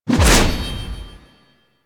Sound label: melee heavy attack whoosh; Warframe; Weapons; Whooshes; Heavy Attacks